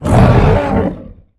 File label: Pain Light; Evolve; Behemoth